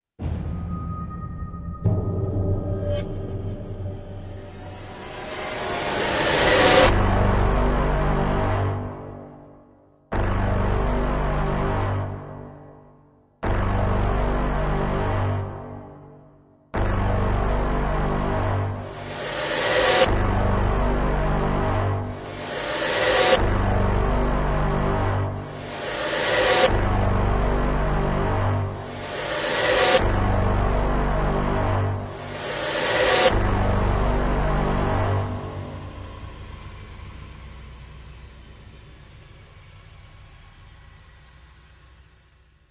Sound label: Pods (No Perc); Intros Machines